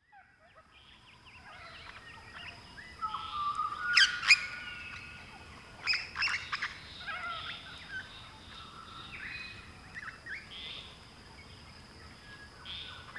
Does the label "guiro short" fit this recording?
No